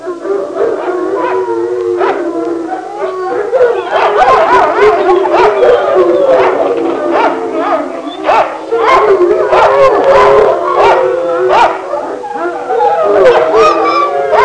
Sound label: wild dogs barking; forest animals